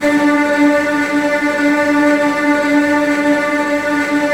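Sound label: STR TREMOLO, Virtuoso Strings, D3, left; ILIO; Kirk Hunter Virtuoso Strings VOL-3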